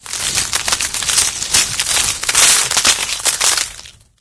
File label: debris; ambient, ambience, rainscapes, rain